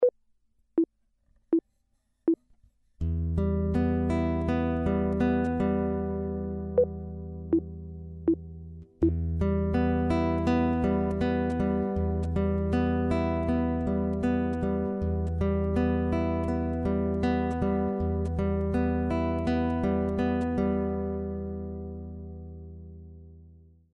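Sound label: chord, guitar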